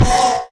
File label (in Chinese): 骷髅马受伤声